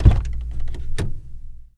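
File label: Chevy Corsica Door Open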